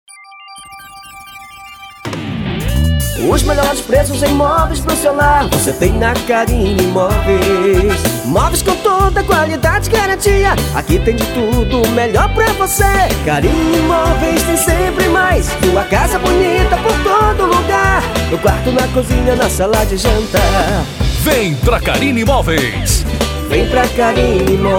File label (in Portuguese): jingle; karine moveis